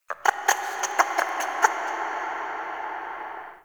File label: Under City Small Creature